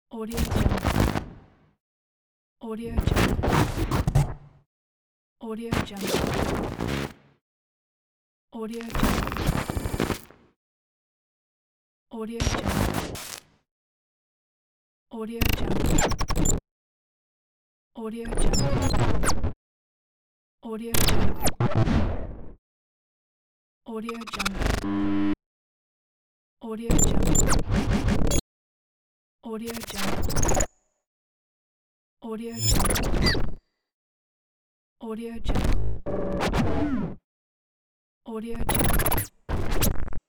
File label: glitch big pack